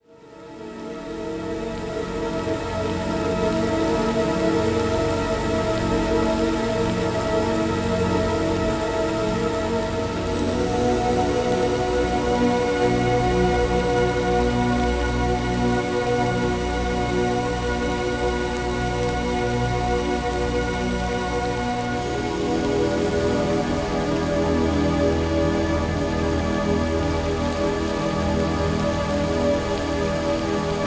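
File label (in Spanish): Melodías Suaves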